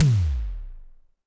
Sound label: TD-20 Tom A, Roland TD-20 Toms